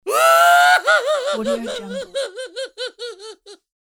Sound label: cartoon comical silly crying